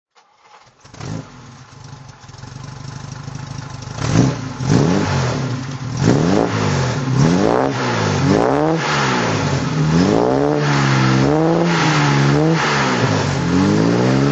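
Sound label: Jaguar XK150S throttle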